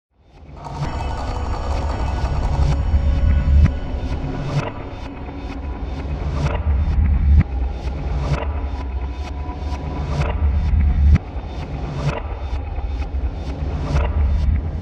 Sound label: City Playback 2 beat Pickup 128 bpm; Cave Music; One Hand Clapping